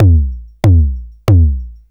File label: LOOP, DRUMS & PERC